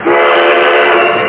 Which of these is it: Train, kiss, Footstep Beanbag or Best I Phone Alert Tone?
Train